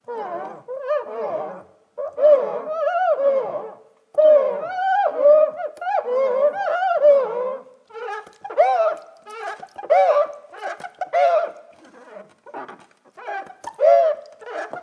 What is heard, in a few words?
Funny Noise Wetted Glass